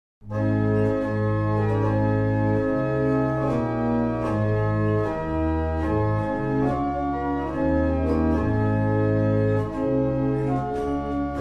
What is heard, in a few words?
barrel organs; Bressingham